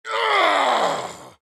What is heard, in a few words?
AHurt